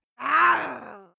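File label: female aggressive, screams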